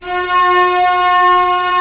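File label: STR C0 FF, strings; Sound On Sound - Peter Siedleczek's Orchestra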